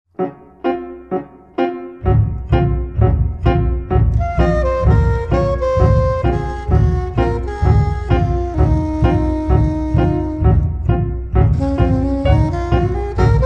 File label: Synthetic Trio Marcia